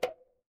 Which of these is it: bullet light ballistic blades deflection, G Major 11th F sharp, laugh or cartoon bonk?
cartoon bonk